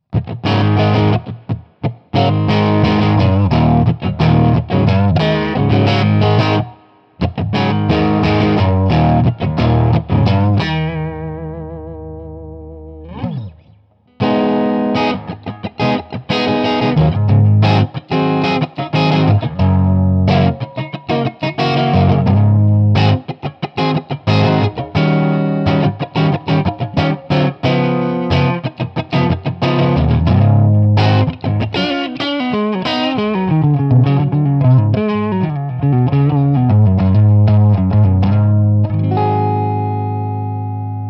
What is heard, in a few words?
DSL Stock Clean, Marshall; Marshall DSL Transformers